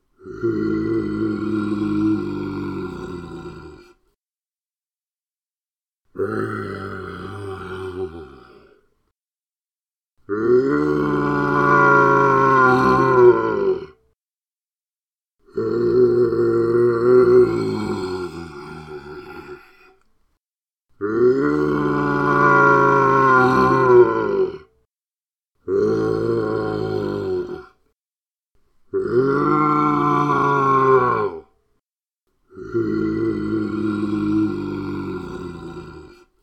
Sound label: Roar Into Can